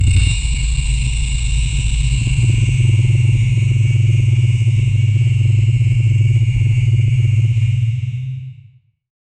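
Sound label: a powerful magical drilling, spell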